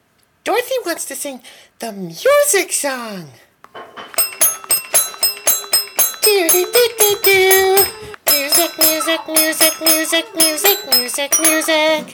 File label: Music